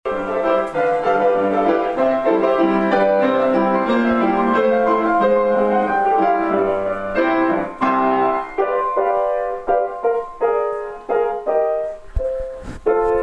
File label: piano